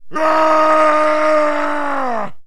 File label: man yell; Mount&Blade Warband